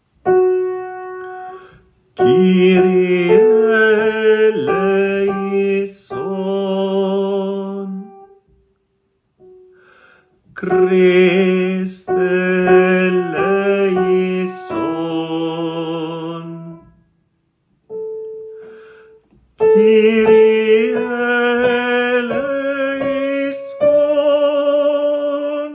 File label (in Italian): Messa Kyrie tenori, coro; terzo messale; coro diocesano